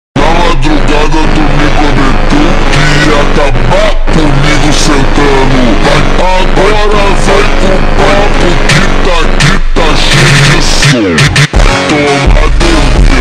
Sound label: super slowed reverb